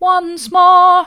once more mid, vocal

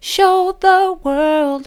soft, vocal; show the world

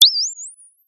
Sound of a bird tweeting nicely

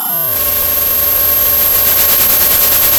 pop, Sound of a cat meowing

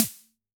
Snr, drum

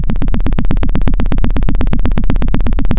pop, Sound of a helicopter

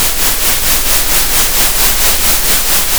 center, Sound of a train

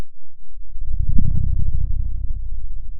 Sound of a dinosaur

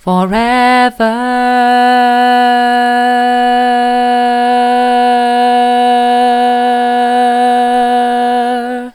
forever soft, vocal

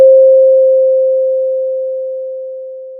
pop, Sound of a school bell

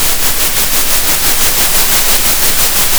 pop, Sound of a train

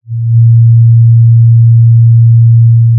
pop, Sound of a cruise ship horn

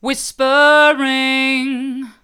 whispering hard

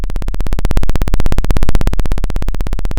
Sound of a chainsaw

